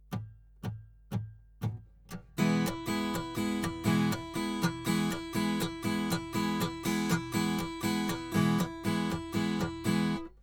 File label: Strum 120bpm